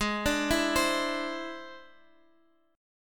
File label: E Augmented 7th Ab, guitar